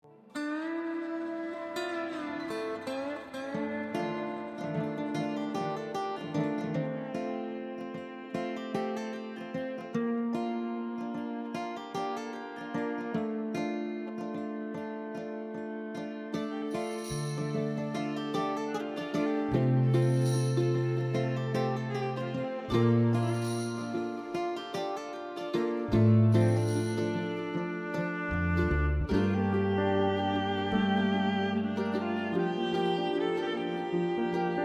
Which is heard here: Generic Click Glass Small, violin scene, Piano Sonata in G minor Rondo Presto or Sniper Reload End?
violin scene